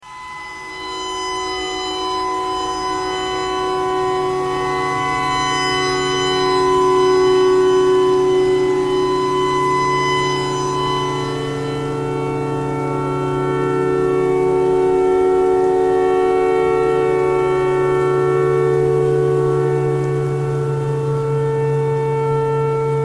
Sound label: tornado siren